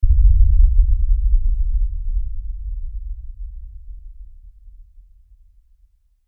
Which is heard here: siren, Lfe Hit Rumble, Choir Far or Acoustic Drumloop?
Lfe Hit Rumble